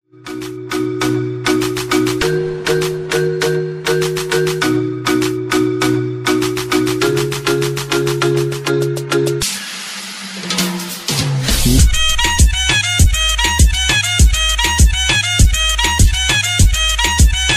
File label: Instrumental Ringtone